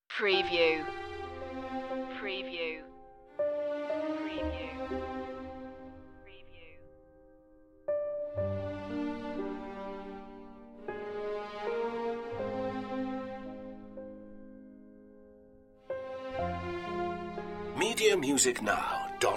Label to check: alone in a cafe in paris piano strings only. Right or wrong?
Right